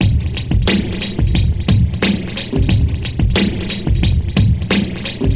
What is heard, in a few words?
Drum Fx Loop, Electronica